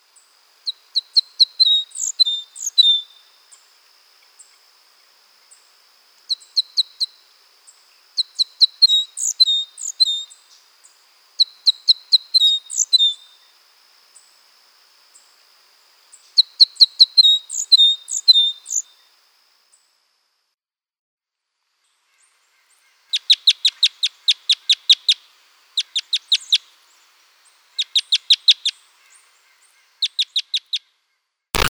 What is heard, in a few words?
Red Crossbill